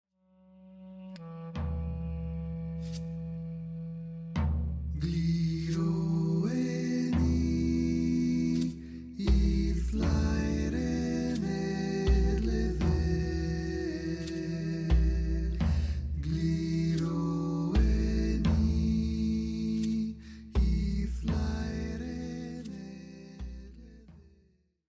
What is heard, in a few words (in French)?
extraits choeurs; Promo 220 Music School